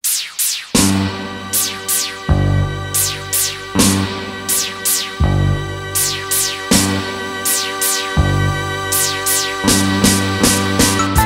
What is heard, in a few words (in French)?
accords